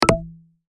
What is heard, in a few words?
pop stop; Handy